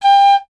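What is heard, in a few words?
flute piccolo G; Miroslav Vitous - Solo Instruments VOL-2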